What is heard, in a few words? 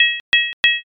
coin insert